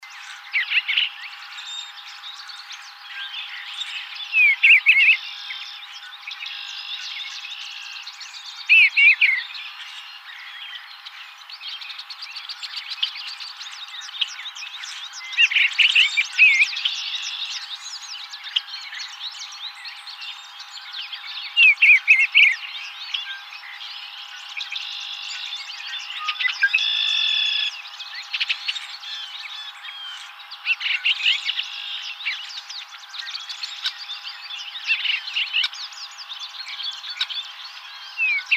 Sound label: Eastern Bluebird